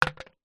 sport cricket bat set down concrete; zapsplat

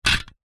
staple gun metal dial turn single; office

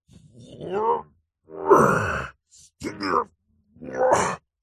human male middle aged strained vocal grunt groan; zapsplat

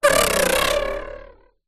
office plastic squeak stretch adhesive tape

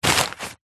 foley paper pile loose movement

